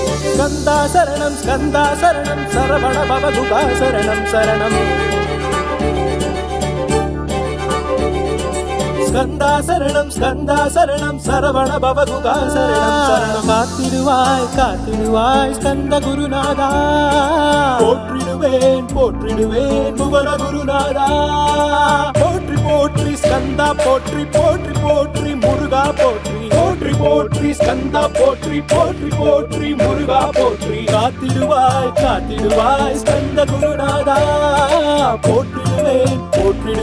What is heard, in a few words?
Tamil Kadavul Murugan Ringtone